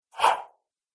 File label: Kung Fu Action Swish, combat swing sound effects; Whoosh Sound Effect